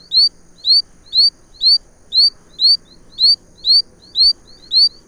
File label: Pseudopaludicola giarettai